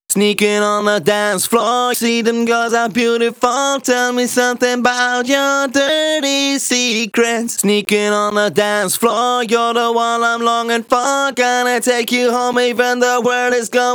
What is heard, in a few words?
Pitched Vocals DRY, 128 BPM; Vengeance Samples; Vocal-Hook Kits; Song Kit